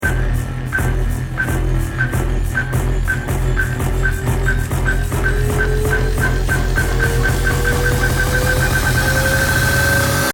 bomb ten sec count

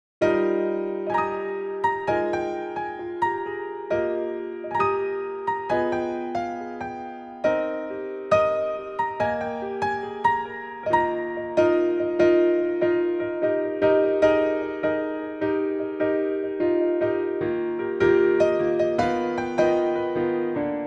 (Piano Arrangement); Island Original Soundtrack

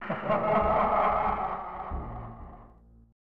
Laugh; The Shadow; SNES

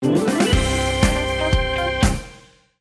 Organ

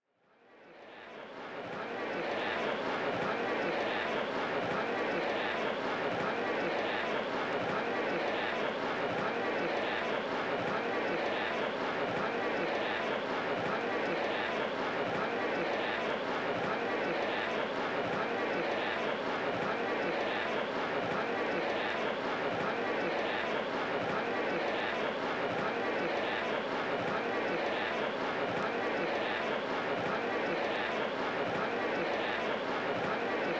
Crowd; Mortal Kombat 4; N64

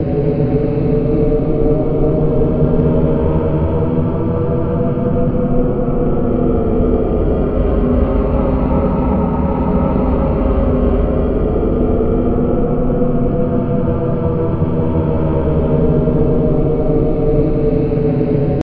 Amb Eerie Choir Wind Loop

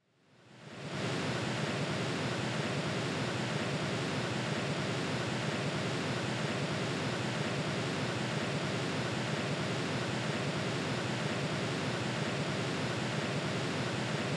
waterfall; Mystical Ninja Starring Goemon; nikko; waterfall of kegon

